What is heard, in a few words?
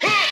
huh, Voice